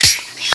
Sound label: adrenaline inject